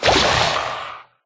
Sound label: convert, drowned; mob; Minecraft